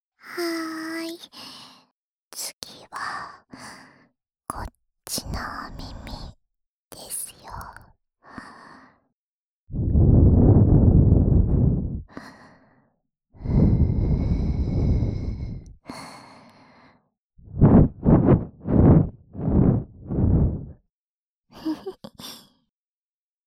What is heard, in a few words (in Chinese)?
用史莱姆清理耳朵（左耳）; 史莱姆掏耳; Healing of King～萝莉魔法师教你何谓快感的黏稠按摩～